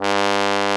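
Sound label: brass, Solo T.Bone; Roland L-CDX-03 Disk 2; BRS 550 T.Bone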